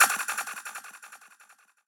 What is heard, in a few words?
bass3